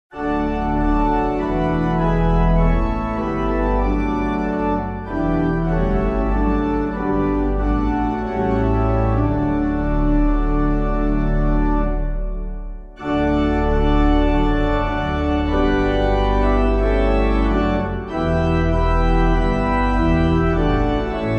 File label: Unto Us a Child Is Given, DRAKES BROUGHTON, organ, hymn